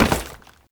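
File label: Oraxia Step Wood B, footstep on wood; Warframe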